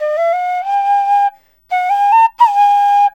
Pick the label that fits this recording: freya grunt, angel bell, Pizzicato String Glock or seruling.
seruling